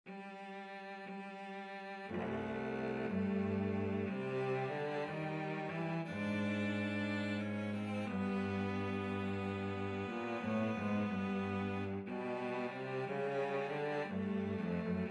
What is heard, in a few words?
happy jazzy birthday, cello duet